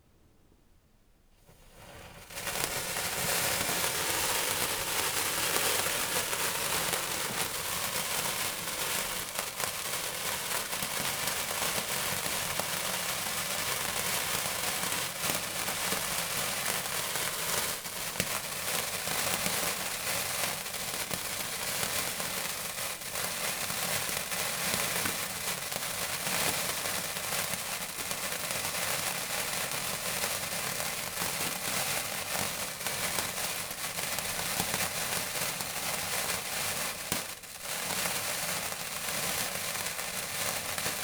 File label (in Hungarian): kettő darab, csillagszóró; Perkupa